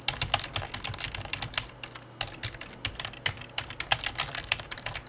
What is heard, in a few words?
key